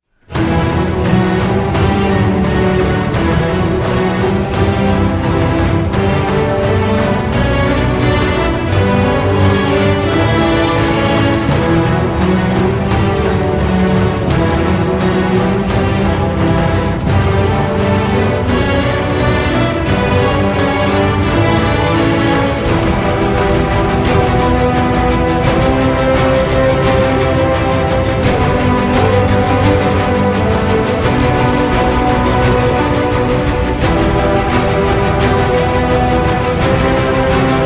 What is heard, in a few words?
Instrumental